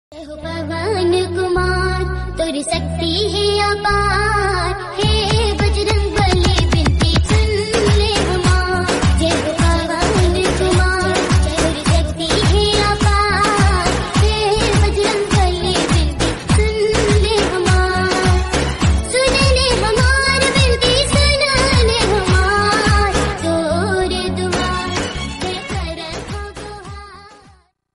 love ringtone gujarati new song gujarati ringtone gujarati instrument ringtone